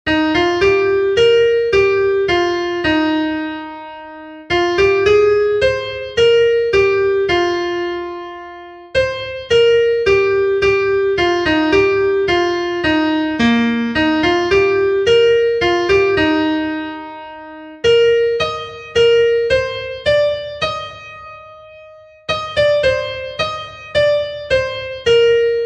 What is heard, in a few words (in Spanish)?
SOPRANO, himnos en piano; cantos del camino